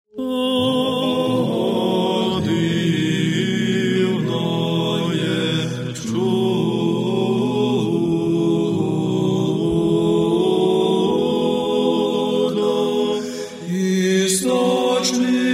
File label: UKRAINIAN; UKRAINE